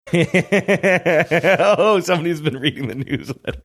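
laughing saying oh somebody's been reading the newsletter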